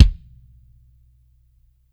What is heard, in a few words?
DEEP DRY KD, DRY KIT; Spectrasonics - Burning Grooves CD2